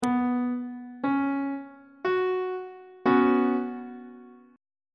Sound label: c flat suspended 2nd chord on treble clef